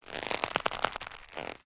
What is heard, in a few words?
foley rope old thick movement twist stress creak; zapsplat